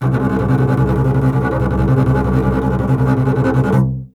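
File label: string contrabass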